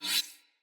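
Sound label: Dagger Whoosh Accent, Swords; Weapons; Player; Josalfar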